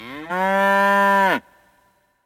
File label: bull; games; Corrida Romance; Wazdan